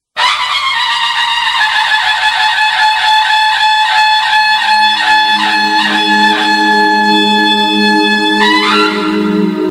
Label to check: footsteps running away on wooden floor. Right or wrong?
Wrong